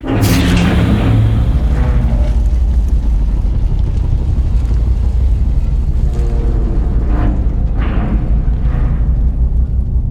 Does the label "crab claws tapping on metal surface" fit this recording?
No